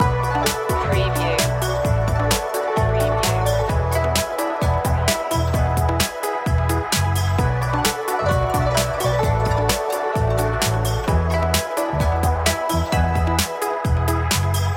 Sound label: good luck loop